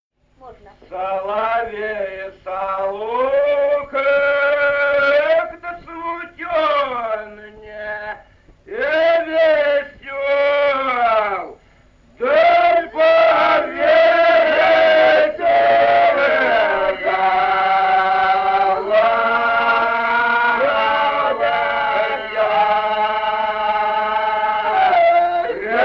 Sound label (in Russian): Соловей-соловка, Фолк